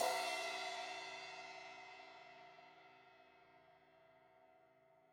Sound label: Crash 16in edge, Cymbals